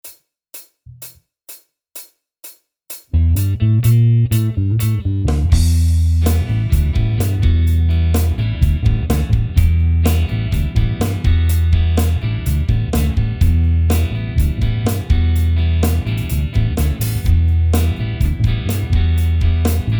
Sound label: solo backing